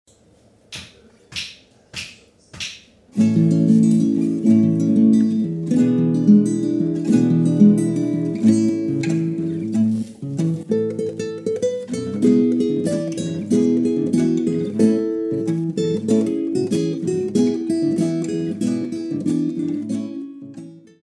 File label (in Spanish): Guitarra